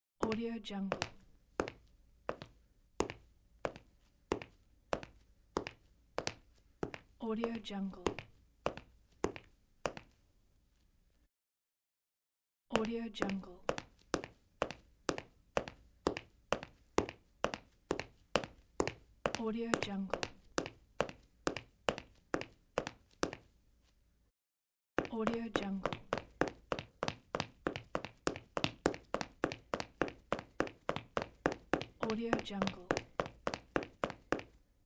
the man steps

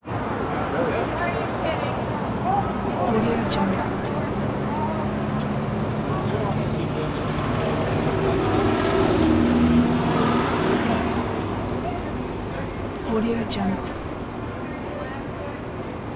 chinatown los angeles constant busy street; prosoundfx

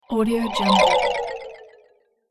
flying fail

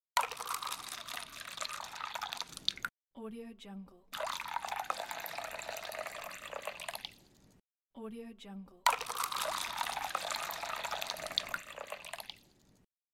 glass water pouring

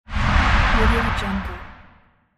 whoosh transition; archisound

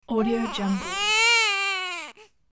baby sniffling and crying

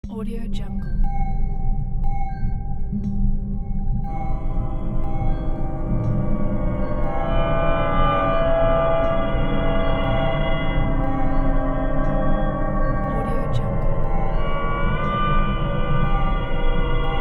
spiritualistic bells

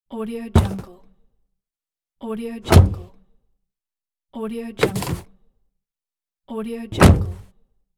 car door open close

